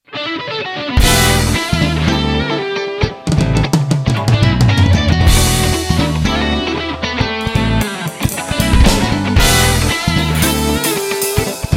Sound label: Big City Fusion